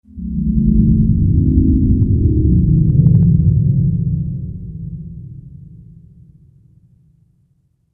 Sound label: Spectral Mists Of Horror, ghost sound effect; Andrew Fleming